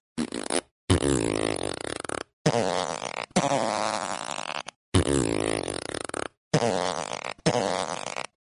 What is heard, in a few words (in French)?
la sonnerie prout